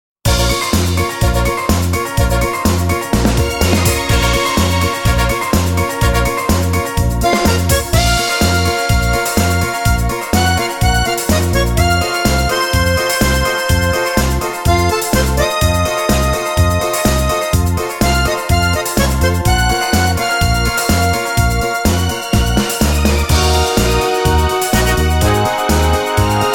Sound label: Bm alto